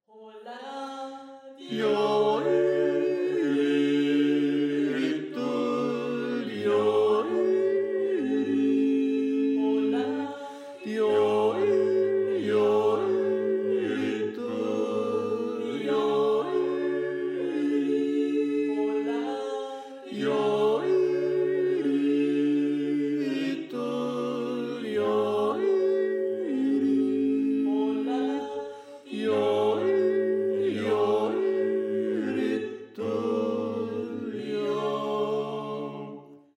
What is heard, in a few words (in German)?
Tenor